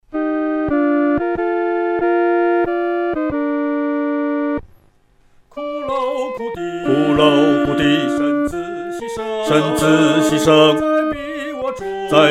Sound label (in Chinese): 独唱 第二声; 髑髅苦地